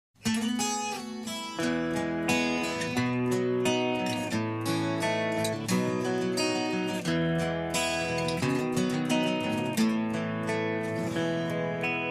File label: Accoustic